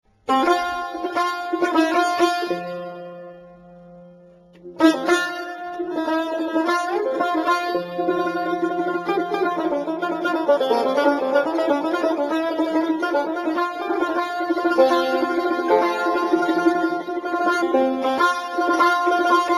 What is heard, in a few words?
Tar Solo; تار سولو